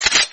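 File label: switching; DoomsdayRescue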